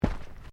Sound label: Footsteps Boots Walking Track Sandy Step